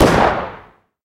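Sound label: distance shot rifle handgun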